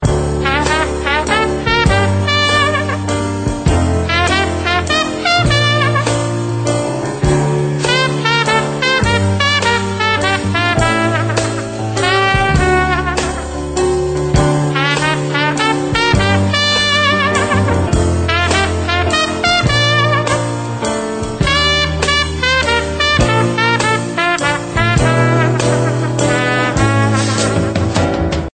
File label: old jazzy ringtone